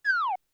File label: fall